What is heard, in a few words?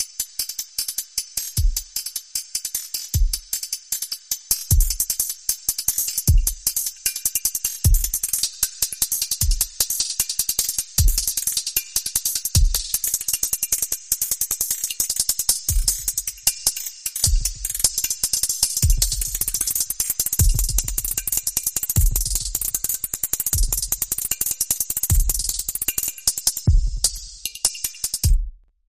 Hihat Hatrick